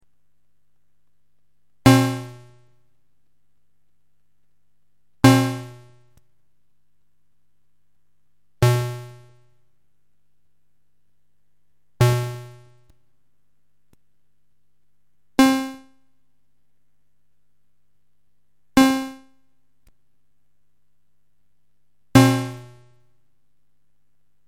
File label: tracking, synth